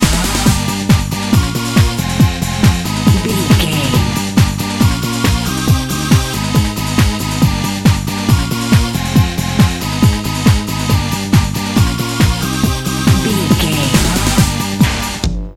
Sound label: cruise club jungle bumper, Dance, EDM, Club, Eurodance; Exercise; Aerobic; Aerobics; Spinning; Workout; Energetic